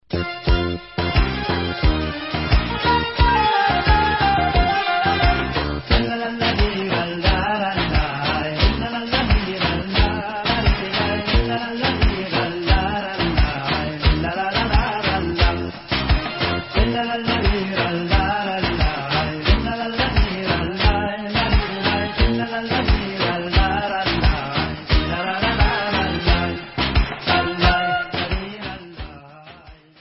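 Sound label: Instrumental, Israeli Dances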